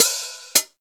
tamed open hat sound c key, Royality free open high-hat sample tuned to the C note